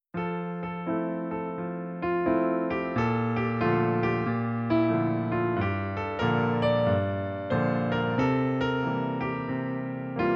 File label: 7th chords